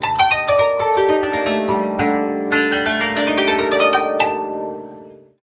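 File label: koto glissando ascending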